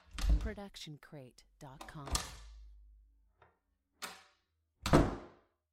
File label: house doors; soundscrate